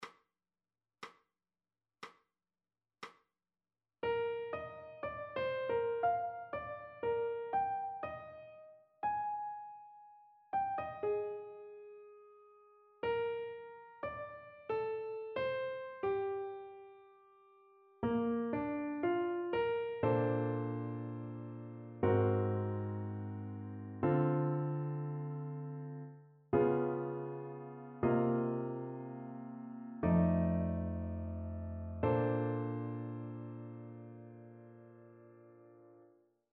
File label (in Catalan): Dictat Jazz i musica Moderna